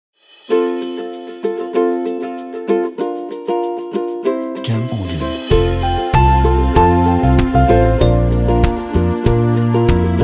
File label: Upbeat Family Summer